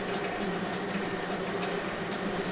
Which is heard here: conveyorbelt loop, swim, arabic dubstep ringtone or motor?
conveyorbelt loop